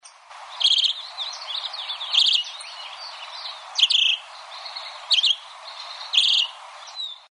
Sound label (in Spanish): voz chiví, juan chiviro cyclarhis gujanensis